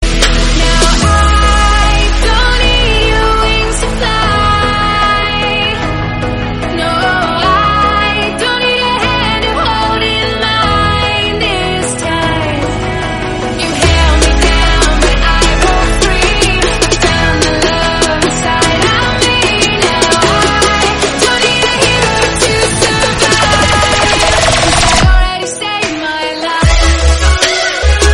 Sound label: Remix